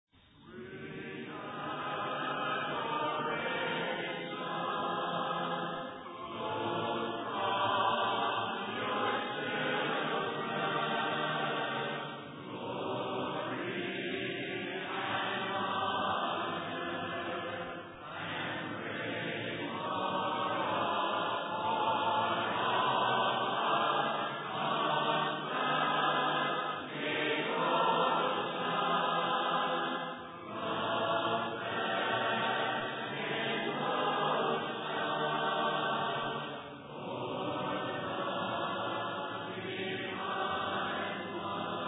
a capella hymn

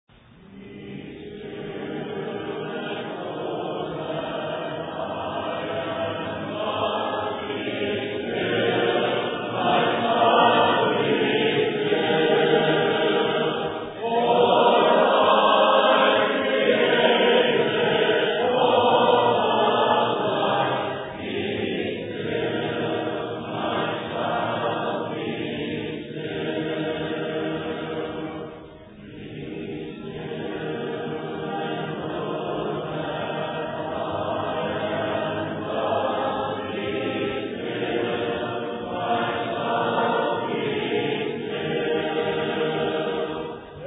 a capella hymn; be still and know that i am god

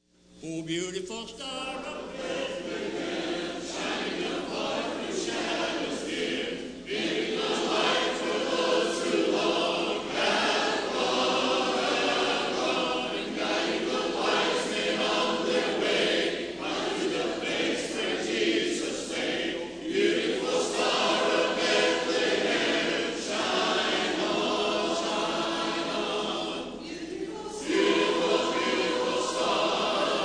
a capella hymn; beautiful star of bethlehem

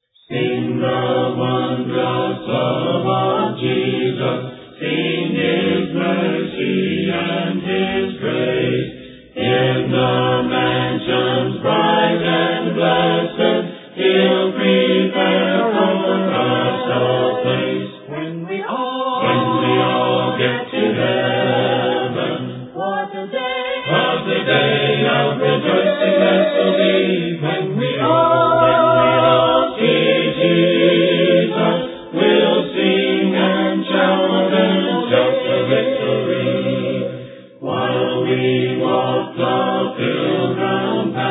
a capella hymn, when we all get to heaven

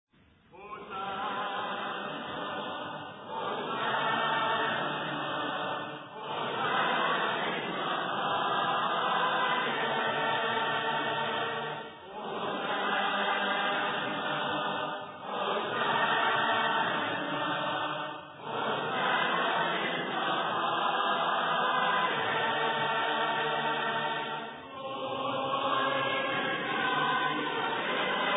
a capella hymn; hosanna

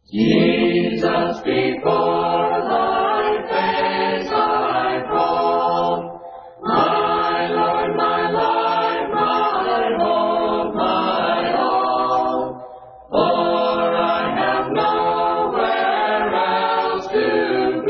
a capella hymn; jesus before thy face i fall

